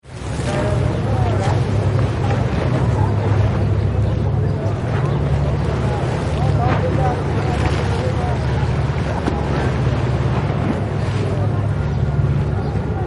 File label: Water Side Near Molla Celebi